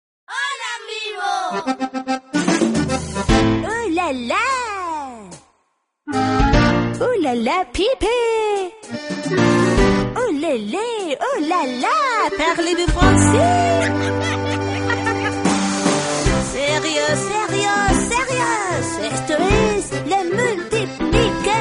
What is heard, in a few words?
pipe